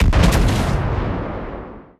ambient port; World Of Warships; port3d

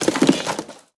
kartuz, guns tanks

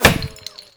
plaster bullet impact; Rust

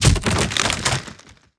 pole laying; World of Tanks Blitz

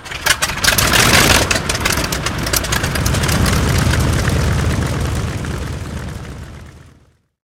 engine start; War Thunder

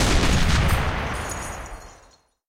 20 23mm tail close, guns tanks

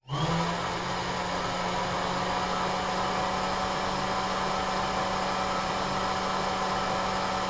Aircrafts L-39 Pitch Trimmer; DCS

